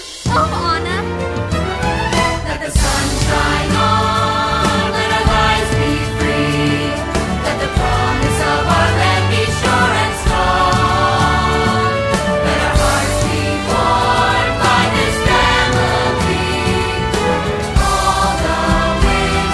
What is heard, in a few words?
ensemble vocal